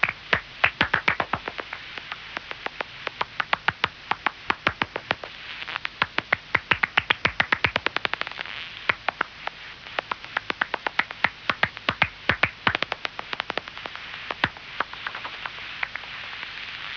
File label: eptesicus serotinus; chauve souris; sonotheque